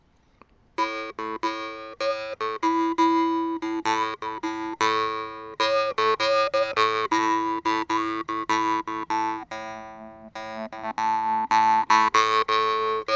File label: Begleittoene Accompanying Notes